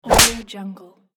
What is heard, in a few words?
whip crack